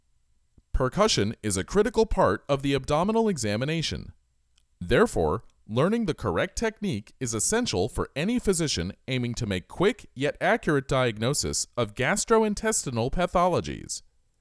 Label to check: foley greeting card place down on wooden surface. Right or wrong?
Wrong